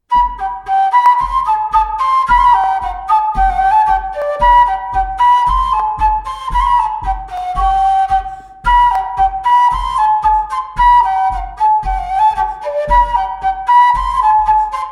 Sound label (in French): Bourree; Ms Aurillac